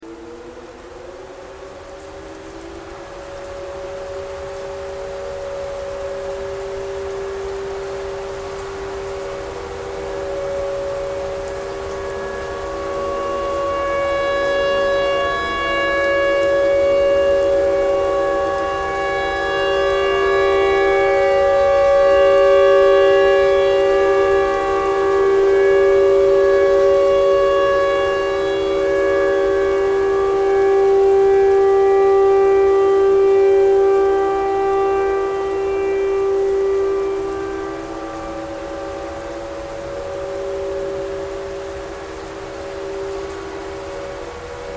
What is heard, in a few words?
tornado siren tornado siren thread noise